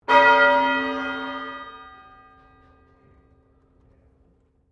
Bell St Pauls Cathedral Melbourne Bell3, The bells of St Pauls in Melbourne; Musical